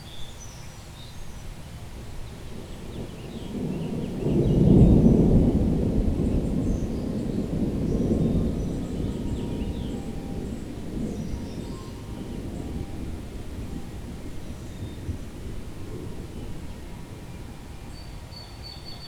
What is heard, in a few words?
thunder